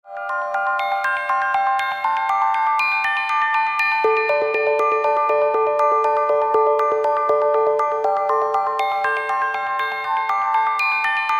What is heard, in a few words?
tibetan bells